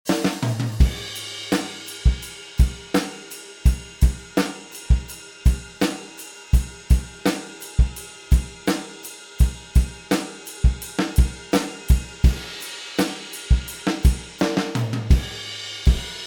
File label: Drum Kit Dry; v76 pre